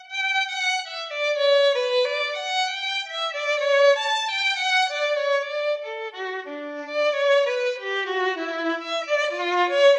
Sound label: duo synth multi oracle